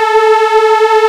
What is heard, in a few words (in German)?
Jingels